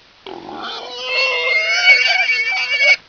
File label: attack; houndeye